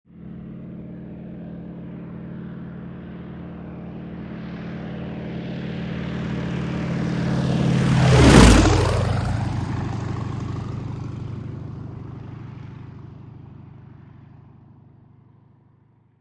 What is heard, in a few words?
Medium-speed Approach, High Whine And Sharp, Low Engine Clatter In Flyby. Medium To Distant Perspective. Prop Plane.; broussard